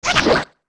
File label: Gekko Attack; Gekko attacking